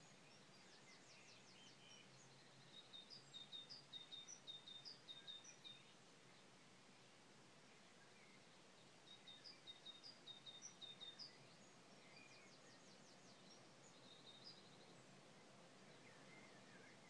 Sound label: morning birds